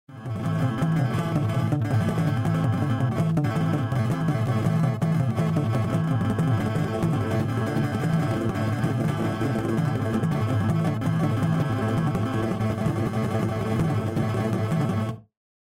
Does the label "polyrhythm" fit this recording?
Yes